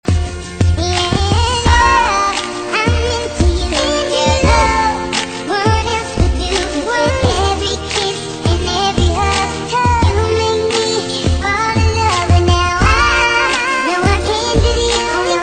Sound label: Chipmunks